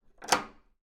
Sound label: wooden lock clean, doors